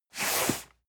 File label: cardboard box medium slide hard; objekte